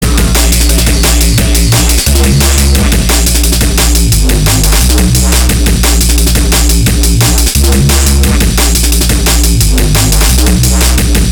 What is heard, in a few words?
Drum'n'Bass